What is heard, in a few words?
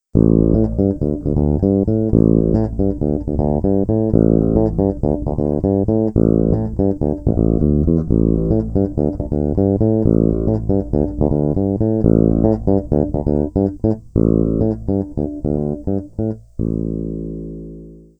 Bridge parallel, bassblaster; rownolegle